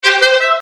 iphone fanfare

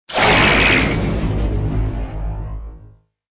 lazer bird special activated; angry birds

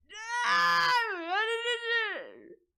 frustrated baby noise

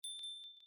japan fuurin

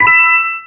coin get; galaxy

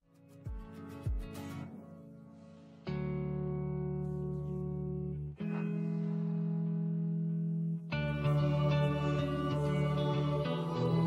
intro music

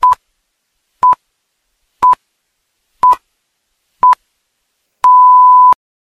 time signal; vmpc radio

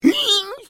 falling; bad piggies ross

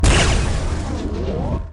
railgun

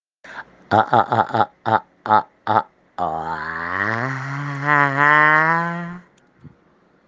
uooorraaaa

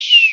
dart score bust

